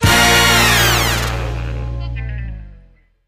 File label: Music Big Funk Band, horn